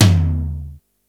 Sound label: tom, Yamaha RY-8